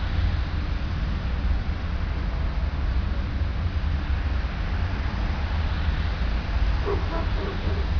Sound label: ambient city